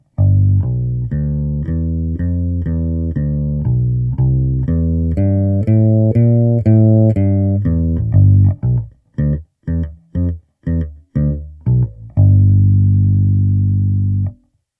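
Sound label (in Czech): Bezpražcová baskytara Cort B4FL - simulace s použitím jiného vzorku (5a) pro baskytaru (jen jedna ukázka); NUX NPB-5 Melvin Lee Davis Bass Preamp & D.I. Box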